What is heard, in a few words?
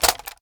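assault rifle raise settle; weapons; gmod; viper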